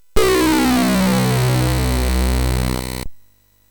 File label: Final Synth Sample, MOS6581R3; hvsc